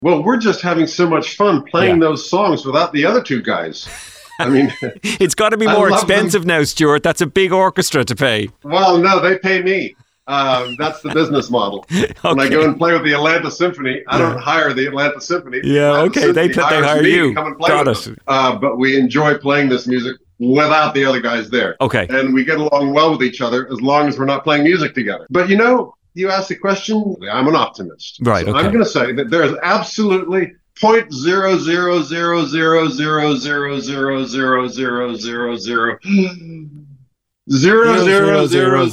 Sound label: Police trio